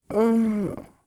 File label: groan; Pacifica